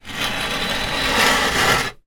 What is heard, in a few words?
chair wood patio folded drag scrape hard ground; zapsplat